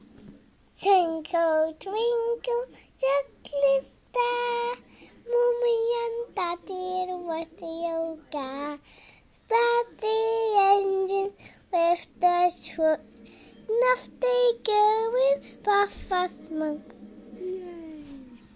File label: singing a cute song